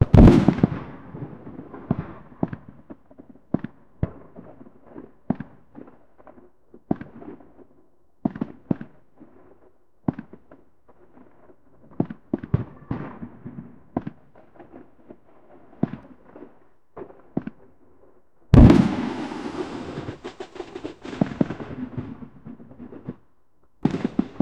a bunker with radio trans, distant explosion

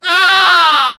whoosh

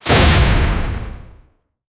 short punchy missile laun; launch

